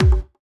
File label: BD; Best Service; Dance Mega Drums